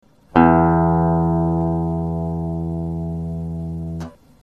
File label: Tuning Lo E, We will start from the low E string (or sixth string).; guitar